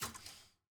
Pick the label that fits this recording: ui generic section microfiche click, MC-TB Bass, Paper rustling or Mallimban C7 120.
ui generic section microfiche click